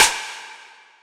Tak

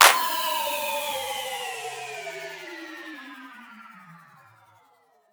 clap drop

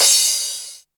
soft hit finish

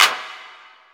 Clap Reverb

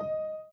Piano Chord

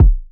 kick rack

